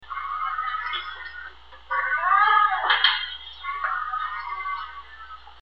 open metallic door

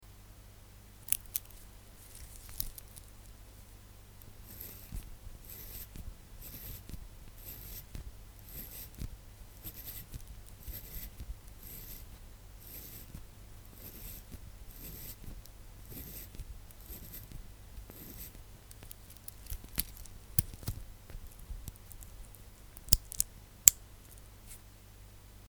rewinding an automatic watch

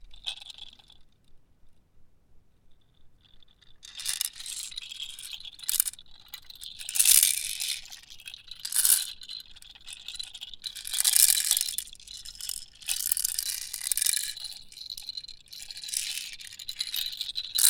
baby rattle

